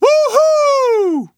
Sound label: taunt woohoo; Counter Strike; CS:GO; taunts